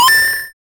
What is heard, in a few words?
game damage sound